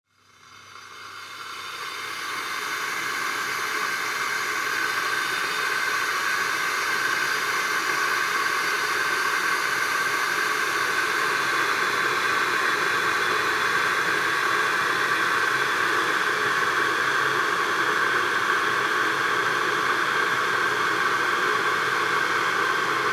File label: water boiler